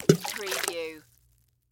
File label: Rock Skip Splash; sound effects bible